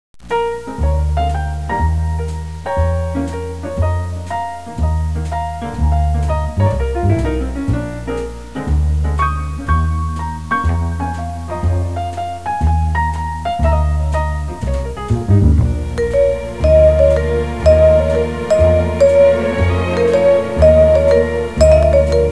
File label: Piano Cue